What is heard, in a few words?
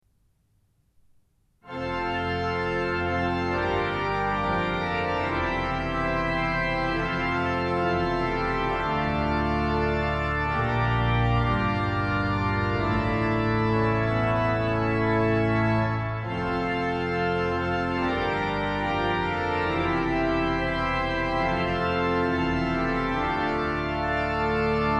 Fanfare; Min egen fanfare.; Rieger Orgelbau Molde domkirke; ZOOM H4n